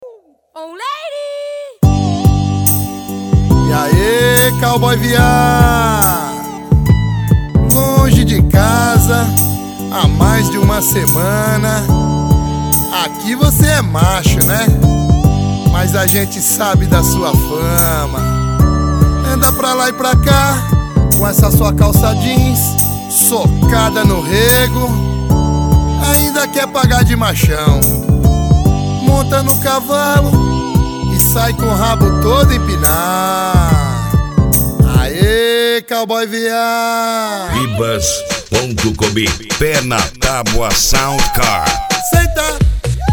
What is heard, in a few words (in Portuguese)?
sound car carnaval